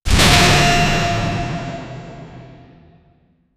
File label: hybrid sound effect of a, collisions